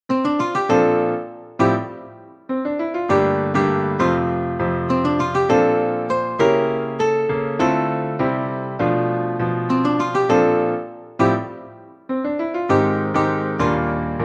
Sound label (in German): Jazz-Ukulelen-Song